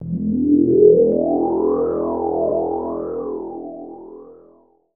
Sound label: DR. LOOP, TRANCE; X-Static Goldmine 3